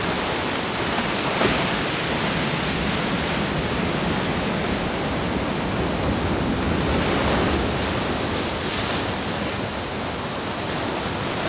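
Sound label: ambience shore; Star Wars Knights Of The Old Republic II; XBOX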